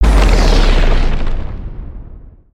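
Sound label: Earth Tornado Mid Blast; Spinjitsu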